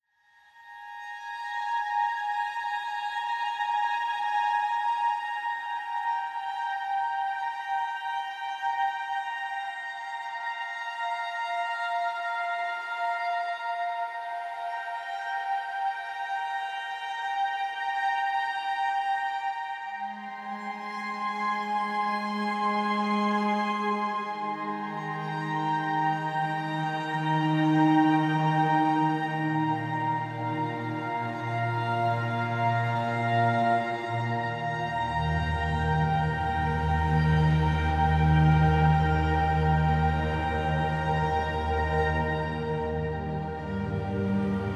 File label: Jazz Music Therapy for Dogs